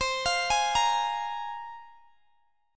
C 7th Suspended 4th Sharp 5th, C, down, Guitar, Open G